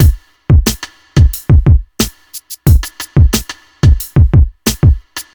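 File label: 90 bpm drum beat d key, kick tuned to the D note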